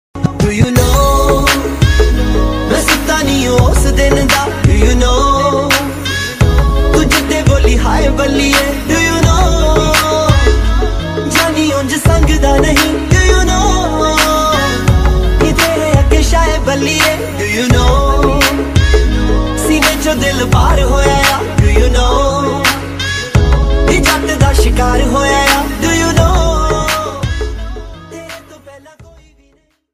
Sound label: Punjabi ringtone, lofi